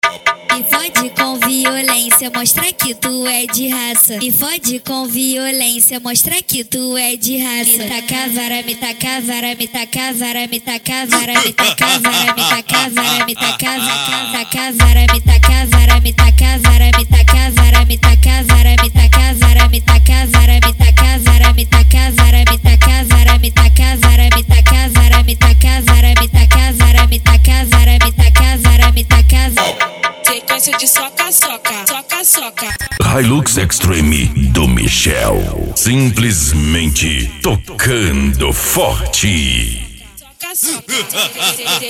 funk mega bass

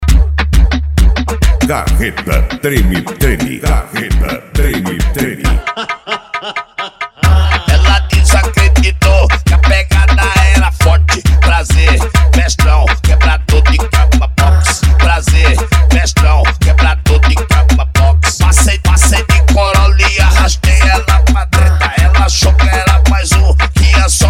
funk mega bass

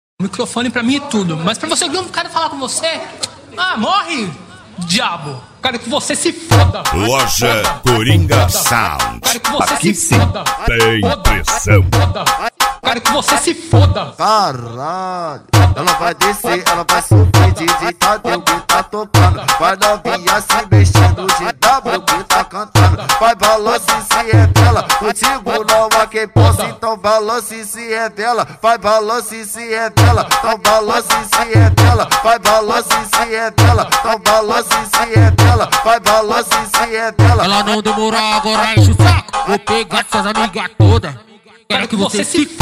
beat; coringa sound funk pesadao

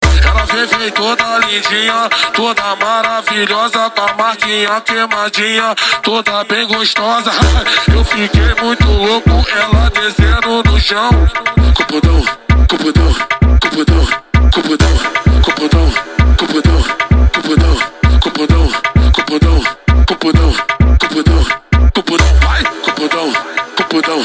funk; coruja som car e borracharia do coruja

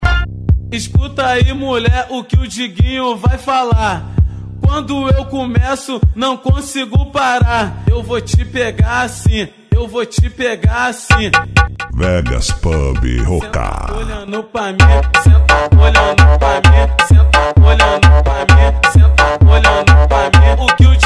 funk mega bass

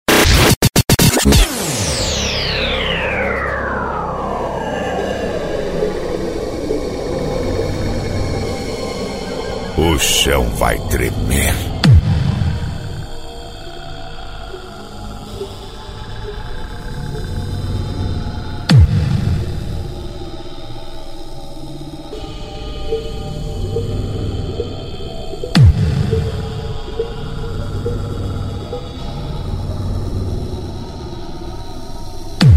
funk e electro house

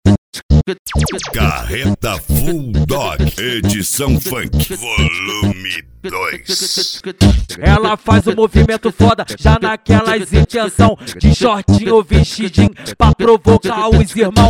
funk

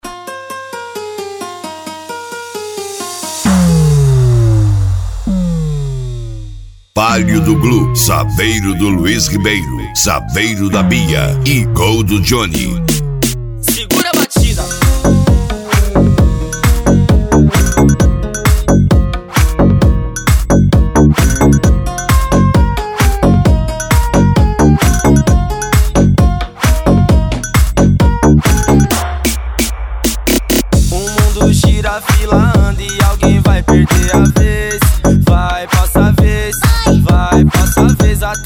sound car; equipe connect sound car

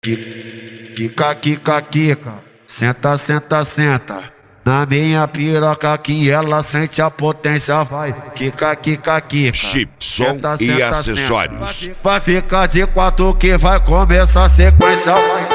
especial funk; som e acessorios

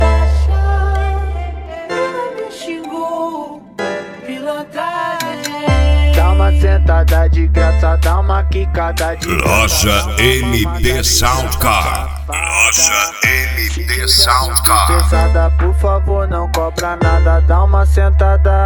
sound car; mt sound car

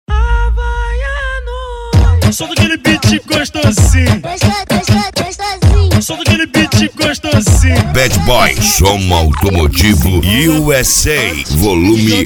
especial funk; cd bad boy som automotivo usa

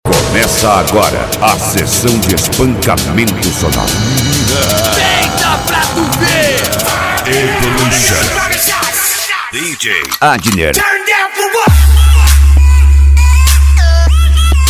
funk tumdumdum funk bass

